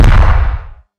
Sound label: Small metal hit explosion; Hovl Studio; SFX Battle sounds; WhiteMan Unity2D